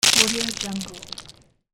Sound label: stones fall